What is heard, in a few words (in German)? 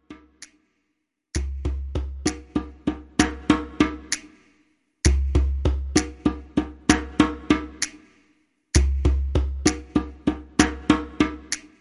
Warm up 3er medium; Uebungsraum 3 und 4